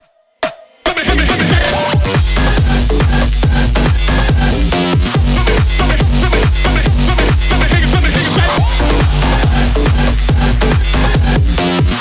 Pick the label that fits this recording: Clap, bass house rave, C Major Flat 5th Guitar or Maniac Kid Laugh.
bass house rave